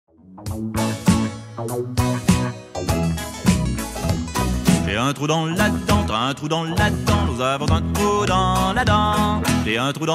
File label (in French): chante Le chocolat chanson pour enfant